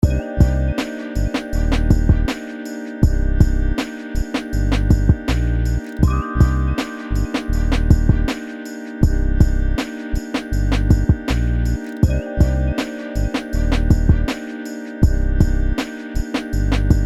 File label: Bladerunner Blues Beat